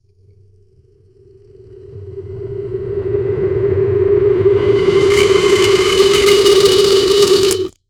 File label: thick liquid drag roar